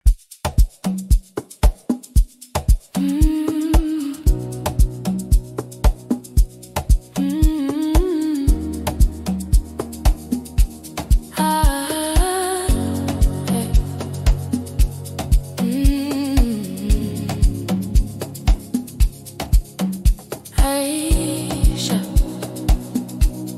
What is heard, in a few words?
Afro-Beats x Amapiano Love Song Zulu Swahili